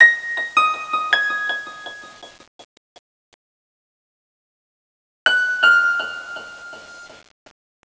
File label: Minimal Piano; Hip-Hop; Fast Breaks